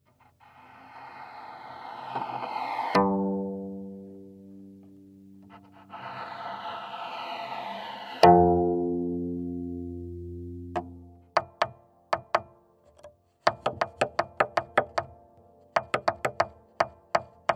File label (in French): Frotter pincer tapoter la kora